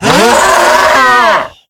Knockout; The Inn; Zara

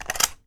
Attaching Silencer Or Scope

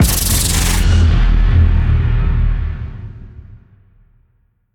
Maze Spider; The Inn

